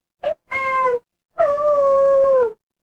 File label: animal cat meow